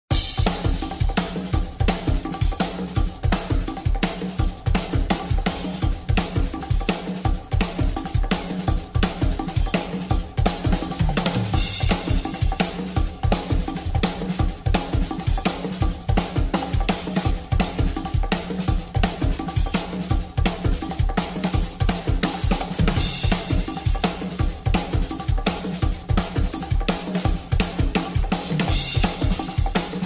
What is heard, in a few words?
Instrumental; One Piece